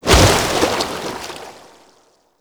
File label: Tidal Wave End; Warframe; Pirate